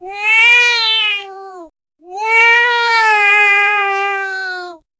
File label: cat meowing realistic